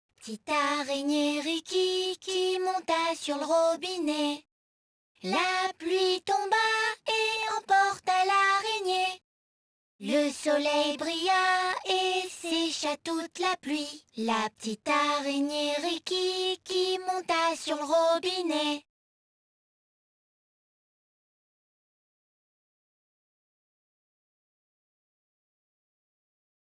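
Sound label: Song Vocals Itsy bitsy spider, French; cocomelon play with jj; android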